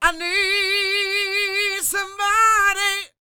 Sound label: SING W, Vocal Planet Blues & Soul, BLUESY; ILIO